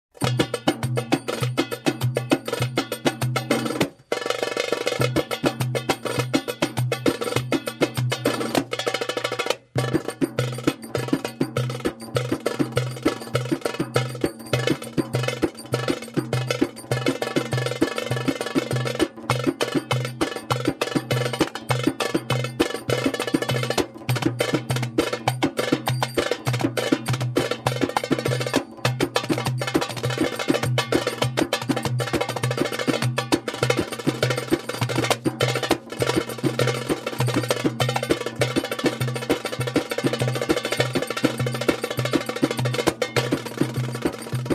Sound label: Arabic Instrumental - Cilgin Darbuka; World Music